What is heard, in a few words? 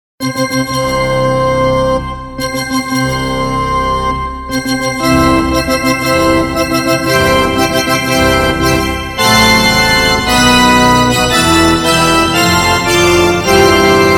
wedding march